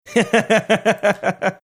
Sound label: chuckling